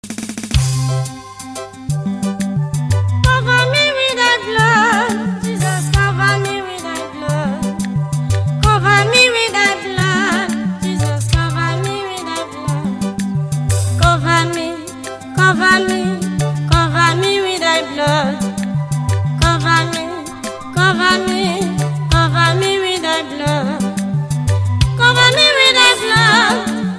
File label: Ringtone